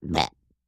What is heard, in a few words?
Cute Burp; burping